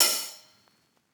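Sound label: open hi-hat; eddyflux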